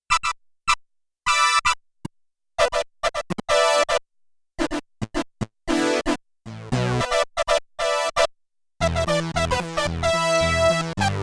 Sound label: Triple Prophet Brass, Prophet 5; Yamaha TX16W